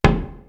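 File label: DONDO 3 LARGE, WORLD PERCUSSION; East West; Global Sounds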